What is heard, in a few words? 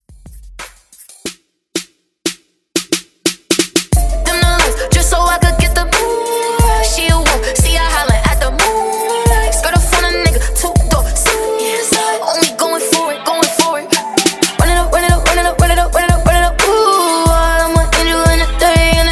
Top40 Re Drum 90 BPM